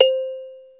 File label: celeste, Yamaha Motif ES drum machine; 300 Drum Machines; Yamaha Motif ES-Series